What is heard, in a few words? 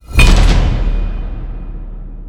sound notify